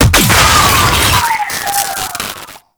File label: Sentient Search Drone Explosion; Warframe; Tau